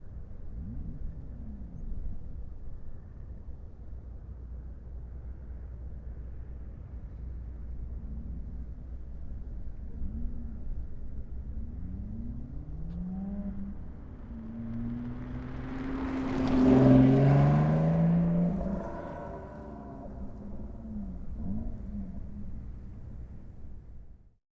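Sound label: lotus exige exterior medium by; ortf mkh8040; Sonniss GDC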